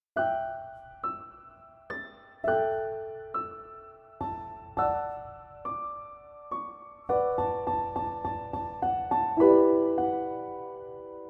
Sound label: piano instrumental; Pop instrumental